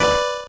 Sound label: level squish B D; earthnight